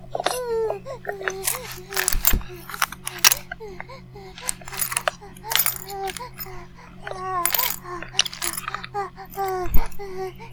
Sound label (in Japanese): バイブ放置; イクたびにわけて